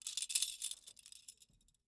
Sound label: Foley Toy Handcuffs Moving